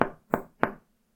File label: knock on concrete; knocking